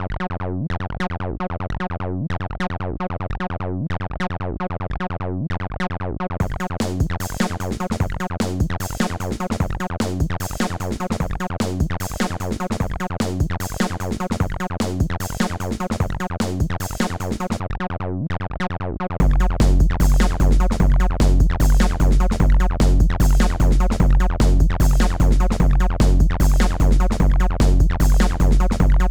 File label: warning loud bass the sequel